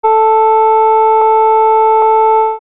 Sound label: synt